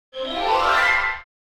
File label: Cartoon orchestral gliss up